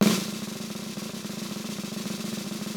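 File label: snare rolls; Brush&Rolls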